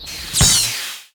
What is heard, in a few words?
Heavy Attack